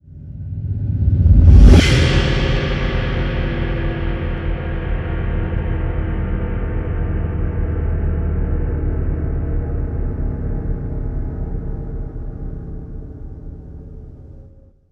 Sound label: Reverse Stinger, Horror; Sound Design